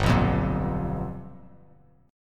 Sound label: G 7th Sharp 9th G chord, Bass Standard; G7#9 strummed; JGuitar